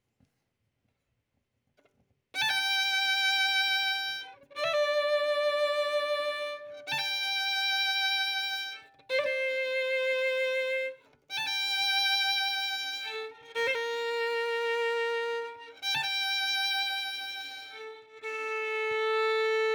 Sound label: Violin; Verdigris Music